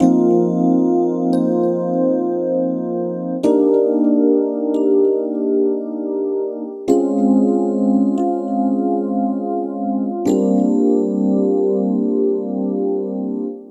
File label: Piano Balladeer, Drum & Bass Keys; Sonic Foundry (Sony Creative Software); Knutrix Drum & Bass